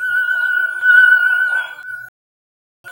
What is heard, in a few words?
Stringlike; Sound FX